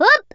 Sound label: jump, Brummie; 1997 MMX LAN PACK (win 95); Worms Armageddon